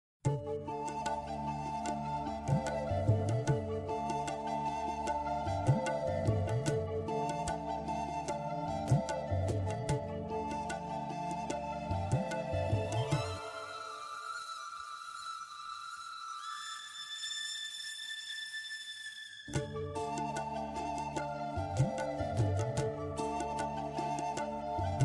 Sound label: Karaoke